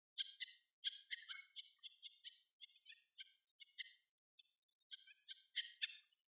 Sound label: double barred finch, birds